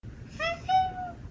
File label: Cat